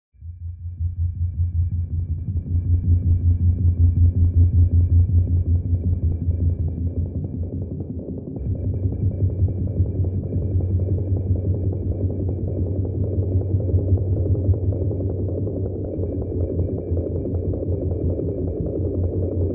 distortion helicopter; sonniss gdc

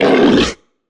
troll monster hurt pain; sonniss gdc

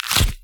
cardboard gnarl grattle; sonniss gdc

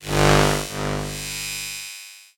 interface transition; bluezone; sonniss gdc

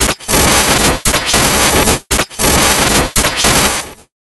dark jumper; sonniss gdc

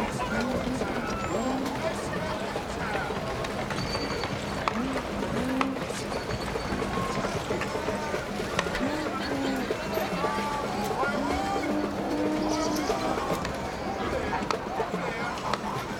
african city loop, ambiences; Michael Ghelfi

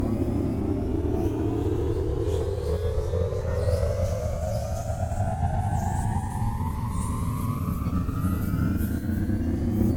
drone tonal two toned shepard tone pitch rising; sonniss gdc

